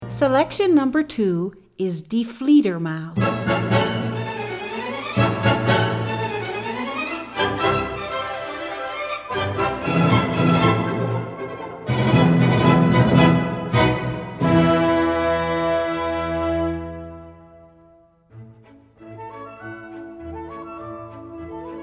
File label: die fledermaus, classical